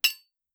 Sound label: metal small; Phasmophobia